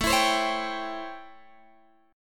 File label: A 7th Sharp 9th A down Guitar Open G